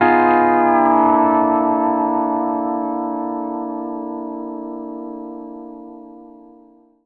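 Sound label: pedal steel chord C6 9 R; PEDSTL CHORD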